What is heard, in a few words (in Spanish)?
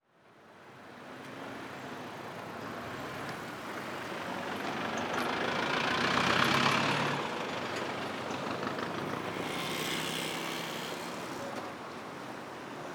AMB EXT DIA CIUDAD Artigas UY Generico transito semaforo